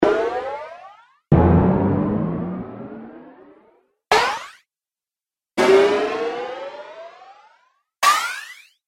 Cartoon Rising Hit Collection; Cartoon Sound Design Sound Effects

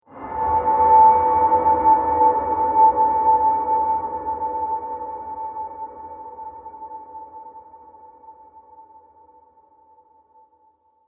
Deathly Ambience Graveyard, Scary Soundscape; Graveyard Fx

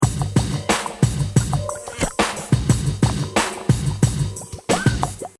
Hip Hop Drum Loop 90 Bpm Full-On; Drum Loops; Royalty Free Drum Loops

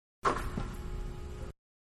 Basketball Indoors-Swish; Sports; Manhattan Production Music

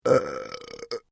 MALE BURP MEDIUM; Male Vocal sounds; Burping; Human

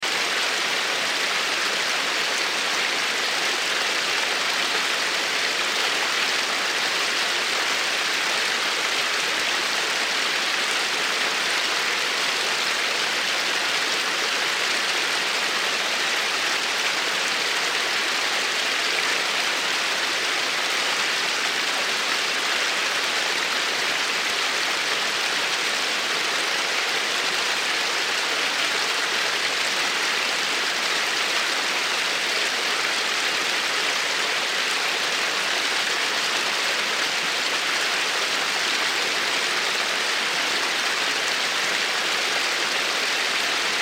Stream Run River, Stream Run River Flow Loop; Nature; Water; Blastwave

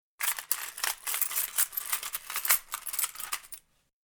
Coin Rattle; Coin rummaging, coin movements. Coin dropping onto table.; Money Sound Effects; Lynne Music

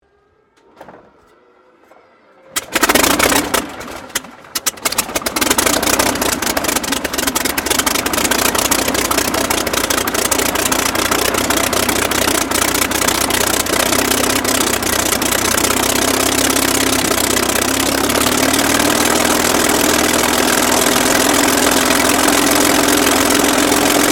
AIRCRAFT SUPERMARINE SPITFIRE MK-XVI STARTUP IDLE; Airplanes; Vehicles; Airplane Sound Effects

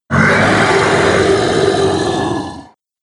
Bloodscale Dragon, monster; Monster Sound Effects; horror sound effects

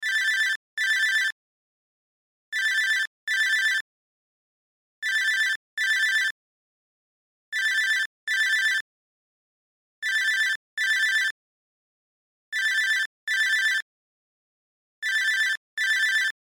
Cell Ringtone; Loop; Cell Phone; Cell Phones (Sound Effects:Electronics)

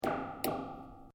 Metal cable strike; Material components to create more complex sounds; Materials

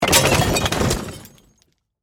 Crash Wood Rock Glass; Wood (Sound Effects:Materials)

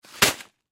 Rock Salt Bag Drop; Drops; Foley; Drop Sound Effects; Objects; Blastwave

